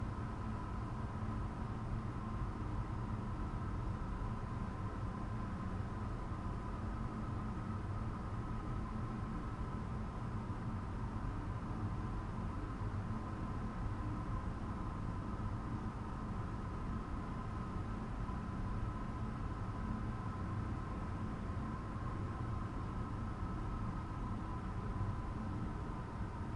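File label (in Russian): гармонический лёгкий густой звук работы производства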